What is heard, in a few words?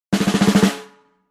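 Drums Snare Med Crescendo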